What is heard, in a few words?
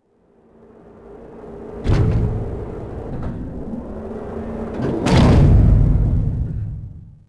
crate Loader; machines mechanical